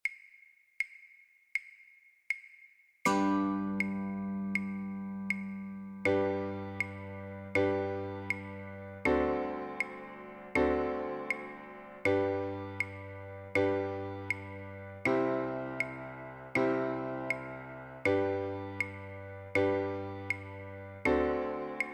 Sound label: Chords, guitar; Auld Lang Syne; christmas